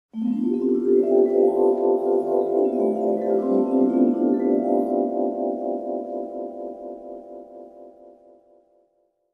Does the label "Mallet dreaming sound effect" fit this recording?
Yes